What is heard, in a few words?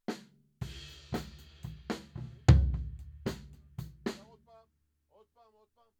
Floor